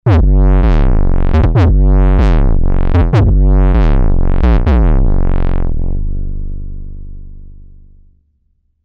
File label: DnB Quick Bass; UA Masterclass; drum and bass